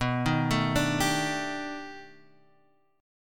B 7th Sharp 5th Sharp 9th, guitar; arpeggiated

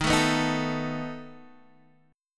B 7th Eb

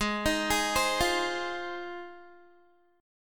Ab 6th, Guitar; Ab6 arpeggiated; 16,18,18,17,17,x; 4E,4A,5D,5G,5C,6F

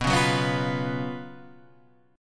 Gb Major 7th Suspended 4th B, guitar

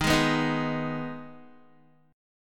Eb Suspended 4th Sharp 5th, Guitar; Ebsus4#5 strummed; 11,11,9,8,8,x; JGuitar

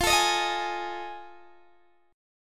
F 7th Sharp 9th F Guitar Open D

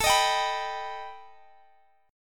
B Augmented 7th A

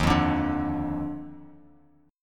D sharp Major 7th Suspended 2nd, Bass Standard; strummed; JGuitar

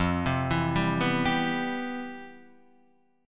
C Major 7th, F, guitar chord; Cmaj7/F arpeggiated; JGuitar

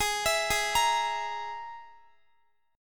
G sharp Suspended 2nd Sharp 5th, Guitar; arpeggiated

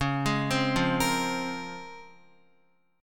Db Major 13th, Guitar; arpeggiated; JGuitar

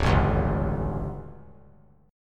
D Major 7th Suspended 4th, chord; DM7sus4; strummed; JGuitar

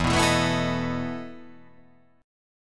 E Major 7th Suspended 4th E

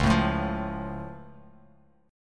C sharp Augmented down Bass Standard, chord; strummed; JGuitar

